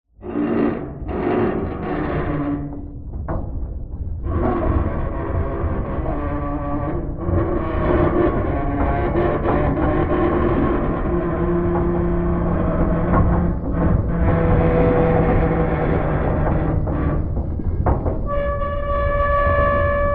vehicles ship large scrape rock grounded underwater perspective